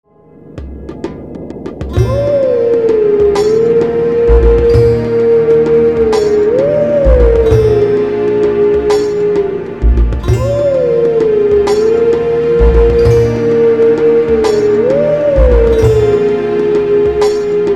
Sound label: wolf song; twilight princess